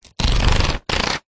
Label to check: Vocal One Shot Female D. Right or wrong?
Wrong